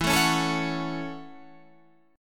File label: F sharp Minor 7th